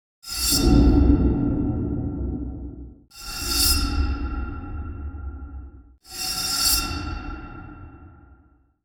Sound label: Espada Afilando En Cueva Sword Sharpening In Cave